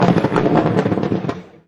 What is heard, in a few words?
rumble long ex, Kart